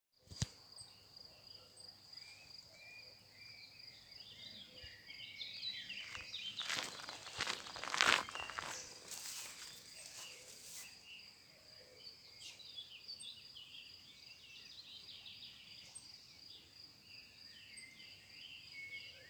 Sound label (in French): Foret Coucou au fond